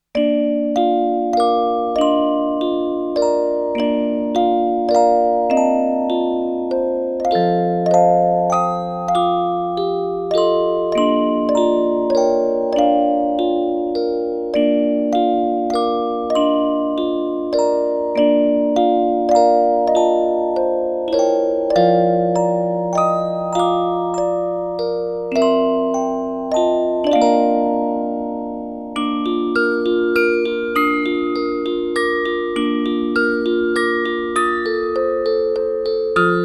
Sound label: Rock-A-Bye Baby Music Box